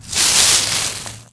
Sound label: leaves